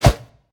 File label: whoosh; smite; raijin